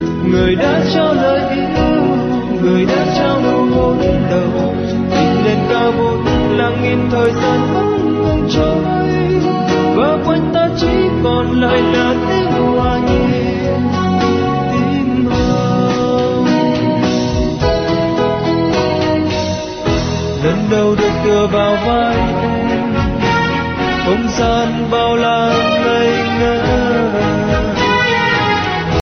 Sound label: latest vietnamese ringtone